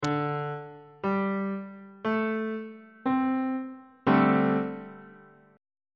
c double sharp dominant 7th suspended 4th chord on bass clef